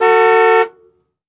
Jeep Cherokee Horn Honk; jeep cherokee 84

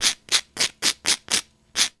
Toast Scratch One Way, cooking food; Toast Scratch One Way x7

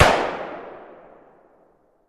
Gun Shot Sngl Ext

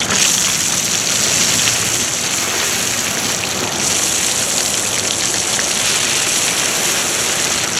Water Spray On Cemen

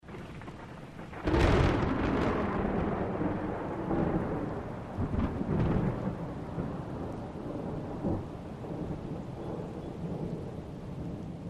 Rolling Thunder Clap

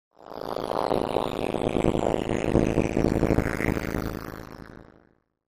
Distort Fluctuating, distortion

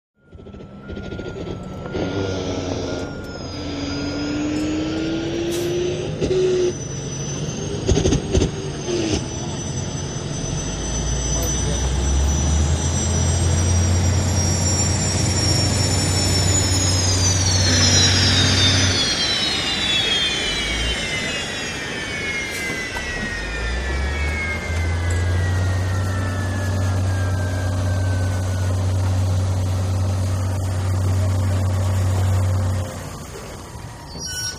Hydroplane Dock cra

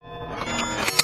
Data Corruption, stinger

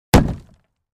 Large Object Hit Sled; crashes & creaks

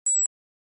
Flash Beep Elongated

